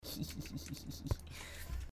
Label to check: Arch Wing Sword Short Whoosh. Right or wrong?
Wrong